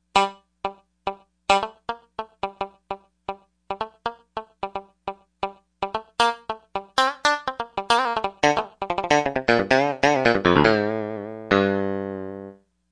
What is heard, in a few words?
MuteGuitar, Yamaha TG55; Factory Presets